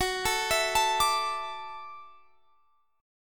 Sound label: F sharp Minor 7th Sharp 5th F sharp, Guitar Drop D